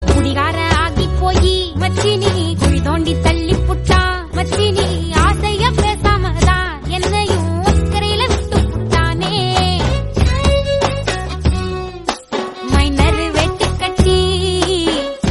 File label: Tamil